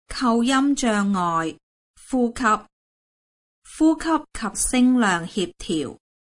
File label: exercise breathing exhale; aphasia